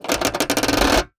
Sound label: war ui source rectangle magnet flick rattle